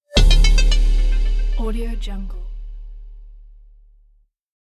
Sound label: soft logo